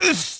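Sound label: m oof, male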